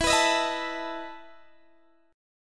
E Major 7th Suspended 4th E down Guitar Open D

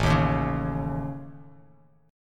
A Major Flat 5th, Bass Standard; AMb5; strummed; JGuitar

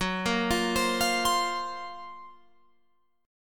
Gb Major Sharp 11th, Guitar Open F; GbM#11 arpeggiated

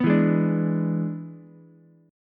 E 7th E down Ukulele; E7 strummed; JGuitar

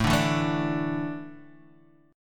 A Suspended 4th, Asus4 chord; strummed; 5,5,2,2,3,x; JGuitar

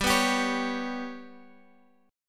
G Major Flat 5th Guitar Open G, chord

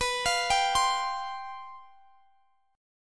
C Suspended 4th B; Csus/B; arpeggiated

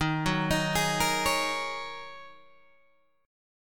D sharp Minor 11th D sharp Guitar Open G, chord

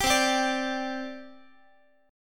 C Suspended 2nd, C, down, Guitar; Csus2 strummed

